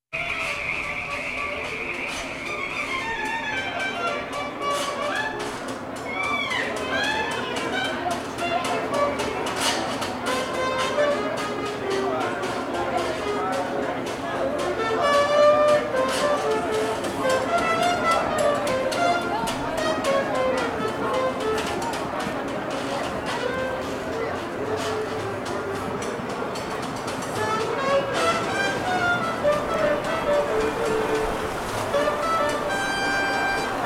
Yacht Club Party

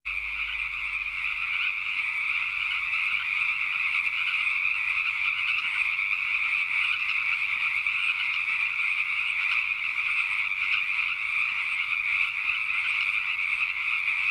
Frog Chorus

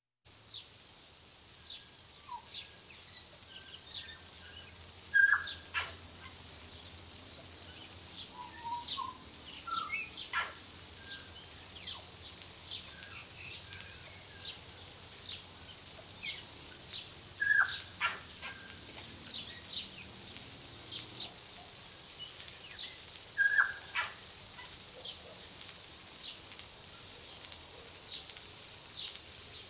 Cicadas Get Revved Up

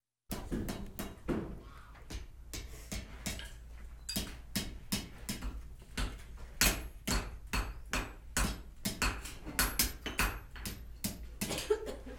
Blacksmith Shop

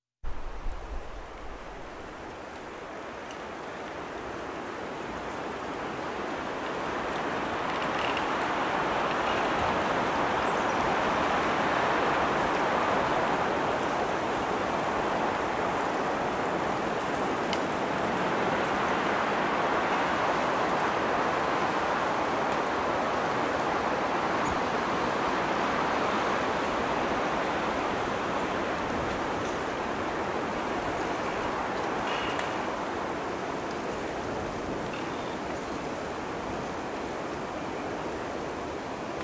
Wind and Falling Snow

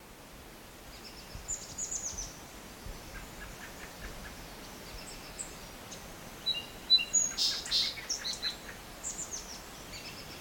Rain

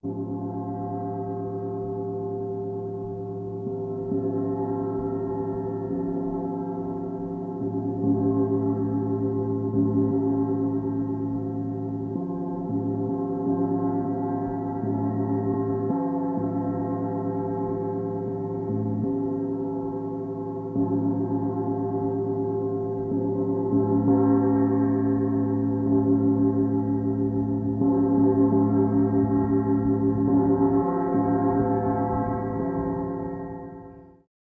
Gongs

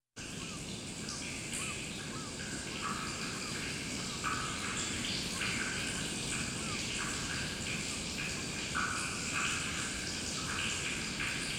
Drain Through Manhole Cover

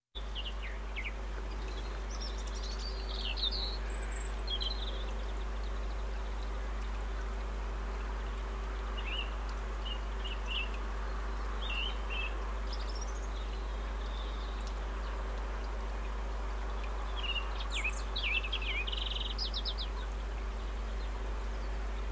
Bush Atmosphere With Highway

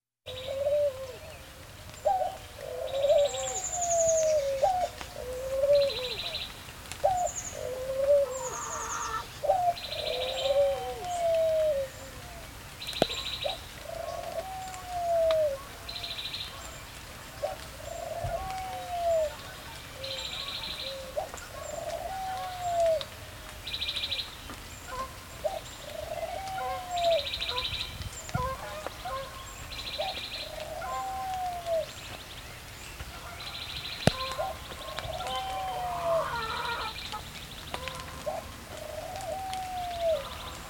Caged Pigeons WIld Geese

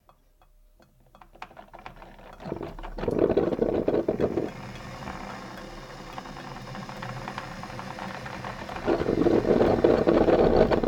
Coffee Maker

